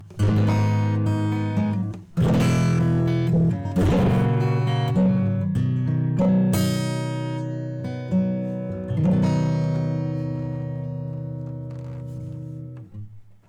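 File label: Exciter Trash Scream; Neutron 4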